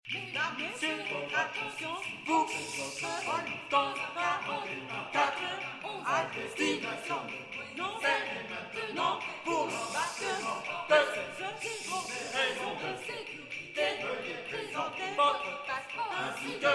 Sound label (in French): aeroport